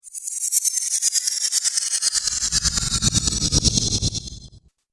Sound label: Stutter Whoosh